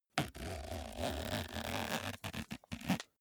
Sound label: zipper small case close slow